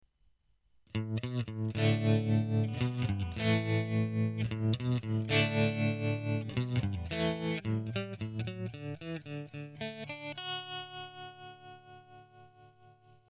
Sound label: clean tremolo, guitare